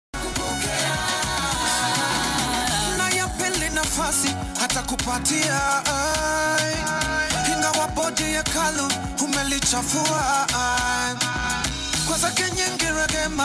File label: Amapiano gospel Mix Mashup; WASAFI FM Sunday Worship